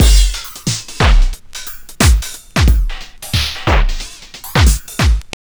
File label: TRIP 90, trip loop